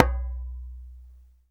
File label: SABARR, AFRICAN PERC; Greytsounds VOL-1